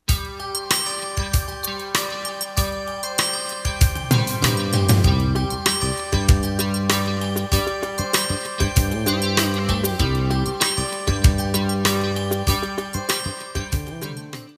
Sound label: musicbed sample, contemporary